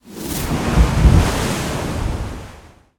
Cleansing Wave; rabbids multiverse; iOS, macOS, Mobile

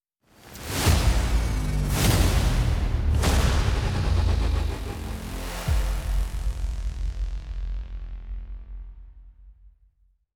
Music Cool Down; Gaiacres

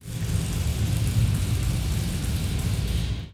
Rumble Pre Chain Break

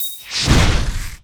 Sword Ground Slam; Hive Boss

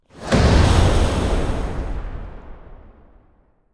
smoke explodes

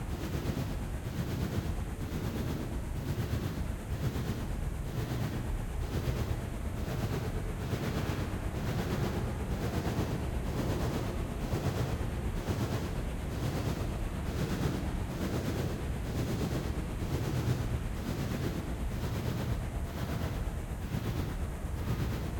Amb Purple Loop

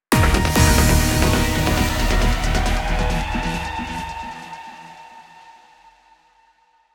Battle Lose; Pokémon Trading Card Game Live

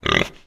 pig

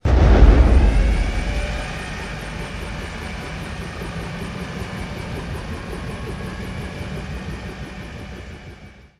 Eagle Take Off

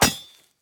Tree Sword Hit

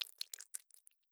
Blood

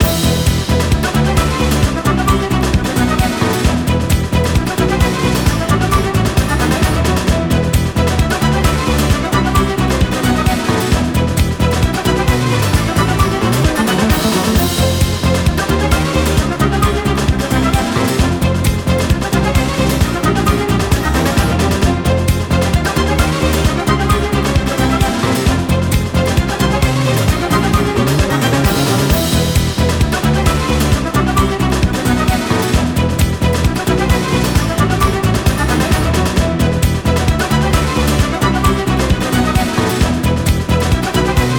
8bit BGM

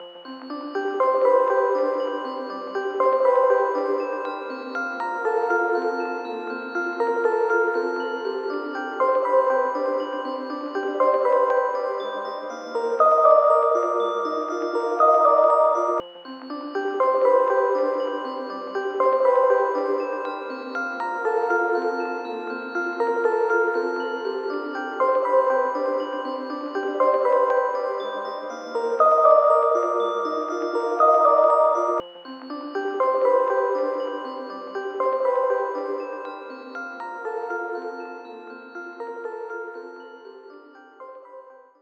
Plaza Ambient; Inkopolis Plaza

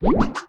Rocket Bomb Ready; In Merged Rocket Bomb Ready

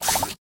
Conveyor belt; Obstacle Conveyorbelt; hello kitty friends match; android

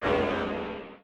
Dark Monster voice hit

